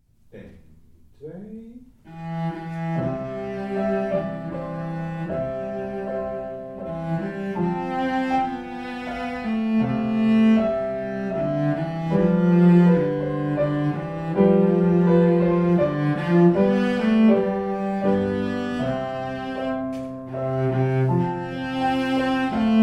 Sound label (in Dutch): wiegenlied; nacht